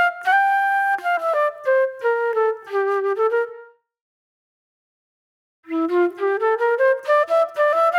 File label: Reflective Flute